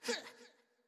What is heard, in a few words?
Attack; Wimp